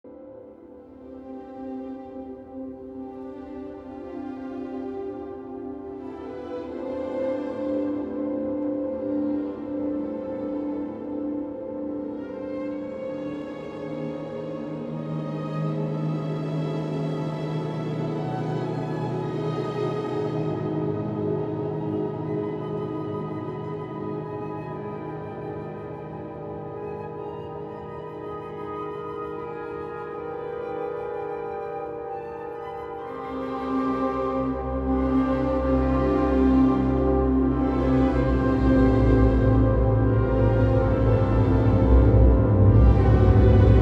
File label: classica